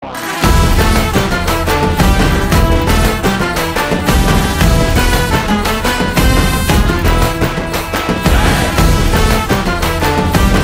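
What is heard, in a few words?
Karaoke Love background music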